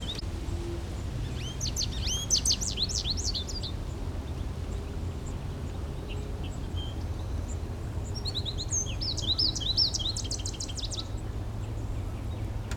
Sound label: goldfinch probably